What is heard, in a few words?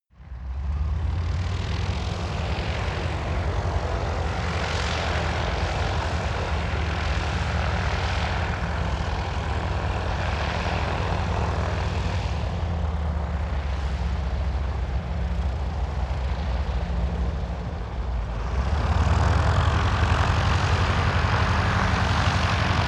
propeller plane ju52 maneuver medium distant